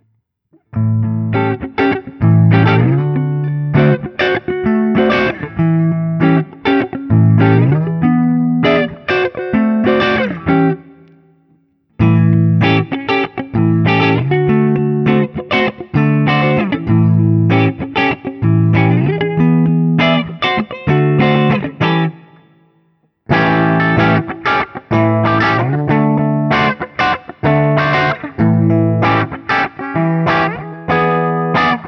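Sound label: Guild Bluesbird Holoflake ODS100 7th, guitar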